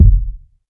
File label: boomy bass drum sample f key; kick drum samples